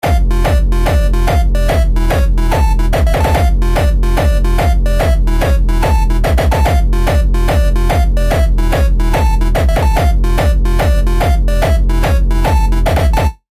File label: jumpstyle